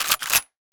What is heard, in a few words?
Shotgun Load; Weapons